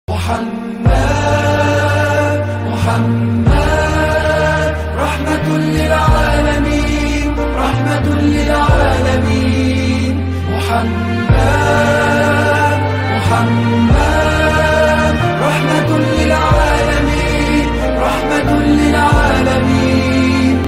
beautiful islamic ringtone; rahmatun lil alameen

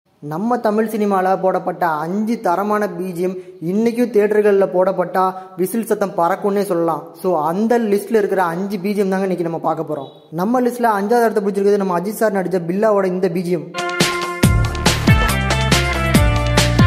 mass bgm; tamil bgm

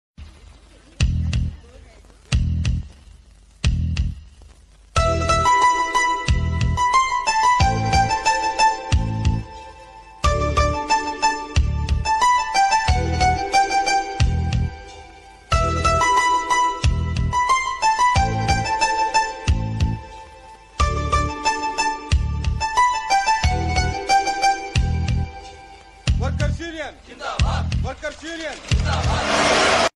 love bgm ringtone, emotional bgm; preminchukundam raa; venkatesh

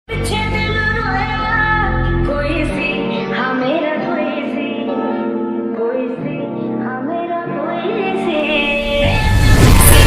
ringtone, call tone, music

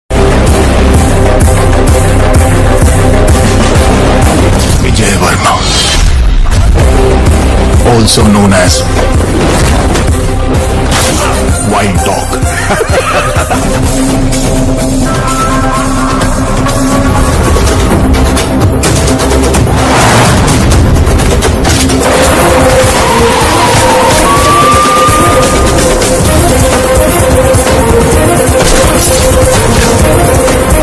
bgm; wild dog

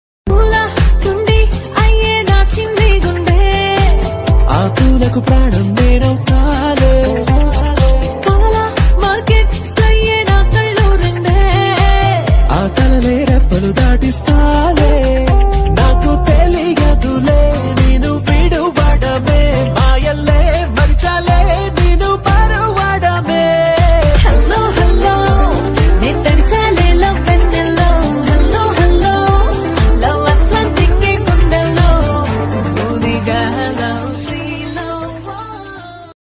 love bgm telugu trending bgm ringtones; nani

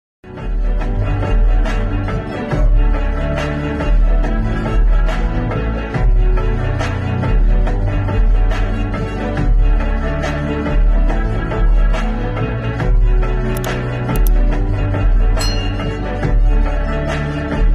love bgm ringtone; adikeshava

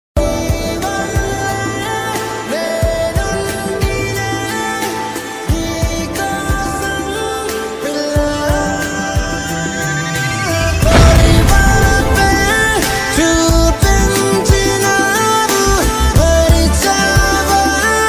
emotional bgm, telugu trending bgm

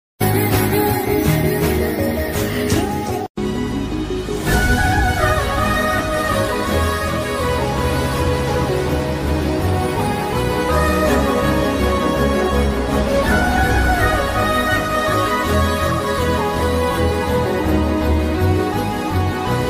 love bgm; mister; varun tej

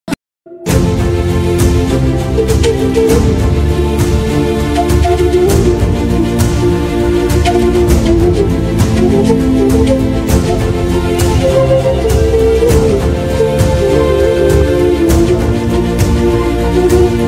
movie ringtone phone ringtone; raja vikramarka

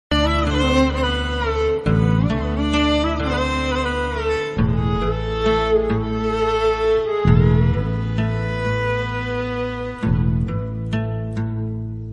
sad bgm love failure bgm climax bgm; rx100

